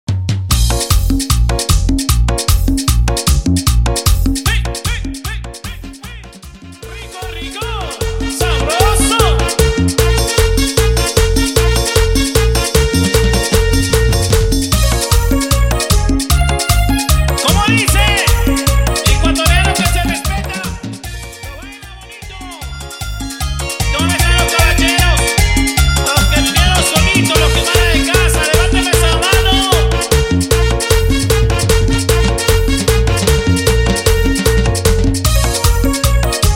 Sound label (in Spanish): Pianos